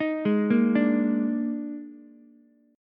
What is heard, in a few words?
Eb Major 7th Suspended 4th, Ukulele; EbM7sus4 arpeggiated; JGuitar